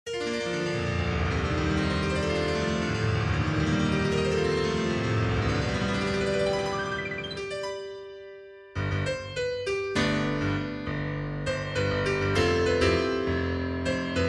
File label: piano ending horrorstory